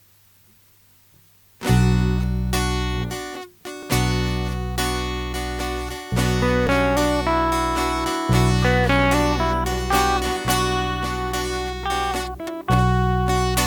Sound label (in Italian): assolo; esercizi